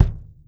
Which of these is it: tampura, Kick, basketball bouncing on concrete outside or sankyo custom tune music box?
Kick